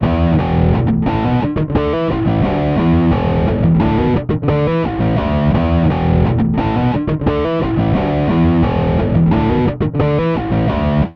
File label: Bass Guitar Loops